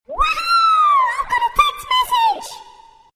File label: Woohoo Txt Message